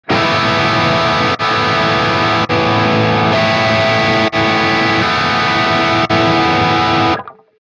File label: lfo fade